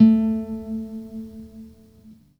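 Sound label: classical solo, guitar; Greytsounds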